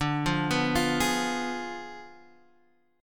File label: D Diminished 7th Guitar Half Step Down, chord